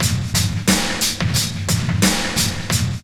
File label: LOOP, DRUM; E-Lab; X Static Goldmine 2